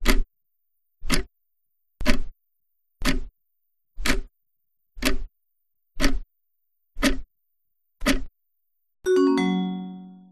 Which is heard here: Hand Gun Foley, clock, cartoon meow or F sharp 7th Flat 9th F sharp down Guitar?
clock